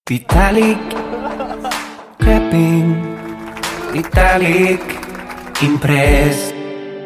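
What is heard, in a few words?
clapping small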